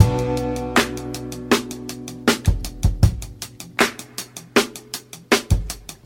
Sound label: claps and strums, Drum loops, Boombap